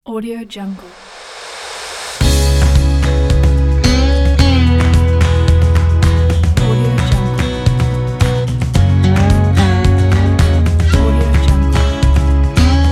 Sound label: acoustic slide guitar logo